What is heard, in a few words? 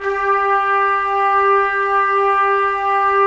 Trumpets; Roland - LA Composer vol. 4